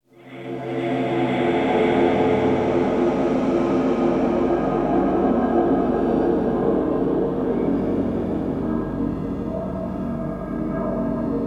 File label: Continue Countdown; Einhander; MUDDLE